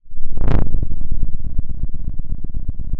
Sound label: pop, Sound of a thunderstorm